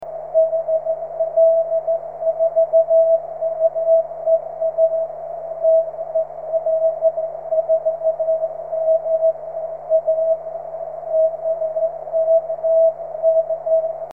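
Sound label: 13cm continuous wave; dl4mea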